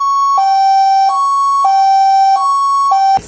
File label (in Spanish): sonido ambulancia